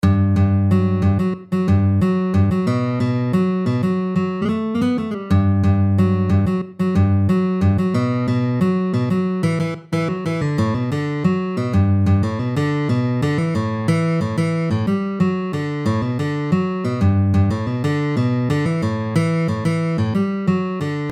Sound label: riff